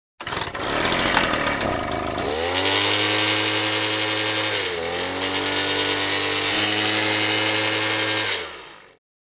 Leaf blower engine noise exterior garden; household

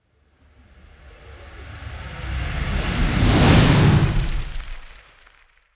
Intense powerful whoosh transition

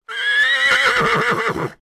Horse neigh and huff

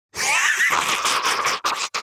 Demon beast imp monster maniacal laugh

